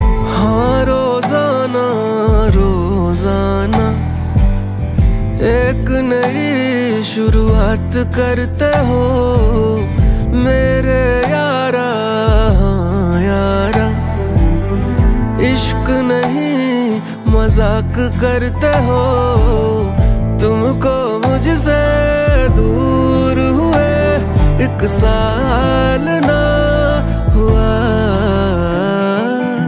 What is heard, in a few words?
Ringtone